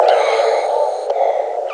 BREATH; East West - Global Sounds; STARS FXS

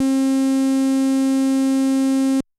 Bass SAW H, Yamaha DTXpress-II

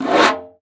Conga, Session Instruments

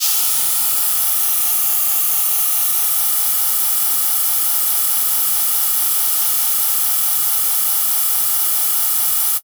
TR-808 Cymbal Loop; Roland BK-5

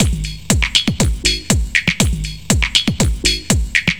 4 floor beat, trance

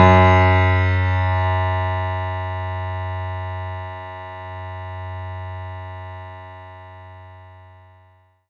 F#2, synth; 90 s Sample CDs; NiceBeats; SynthLine VOL-23

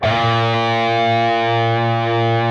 PRS B, PRS LEAD, Guitars; Spectrasonics; Hans Zimmer Guitars VOL-1

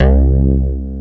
ANAL BAS C2, Bass Analog & Synth; USB Soundscan vol.12; AKAI; VINTAGE

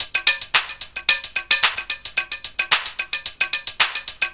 PERCUSSIO 110

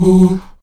VOX AHOO, Afro Chants, Stacc Ah Oos

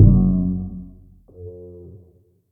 Gargoyle, Effect; Yamaha DTXpress; Effect 1

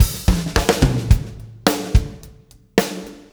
PULL, Backbeat; Spectrasonics

